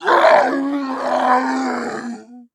zombie growl pain

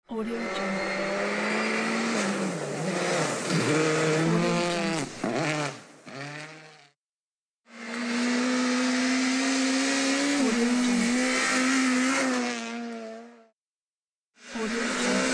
racing cars sound

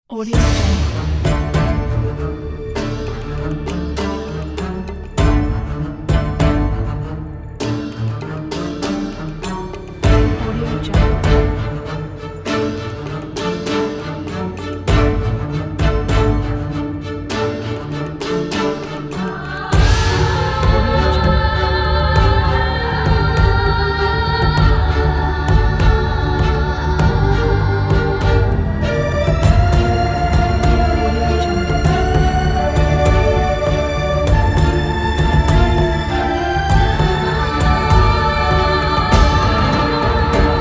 asian mysterious desert

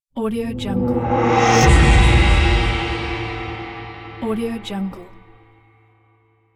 trailer gong sound effect

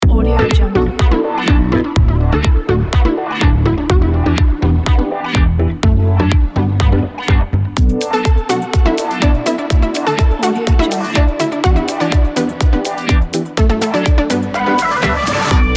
upbeat summer pool party

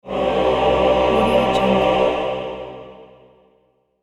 long male choir chords

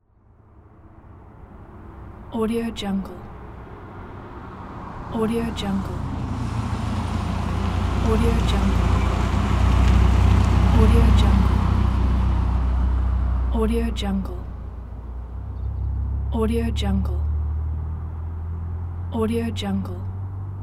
car passing by

